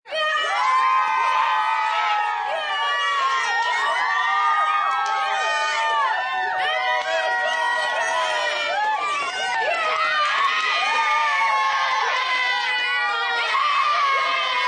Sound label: Group Cheer, Cheer, Group, 10-12 children, rowdy; Yelling Sound Effects